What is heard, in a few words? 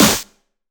Snare; Vengeance Samples